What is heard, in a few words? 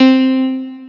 piano